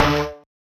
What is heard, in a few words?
marimba steeldrum; ゆめ2っき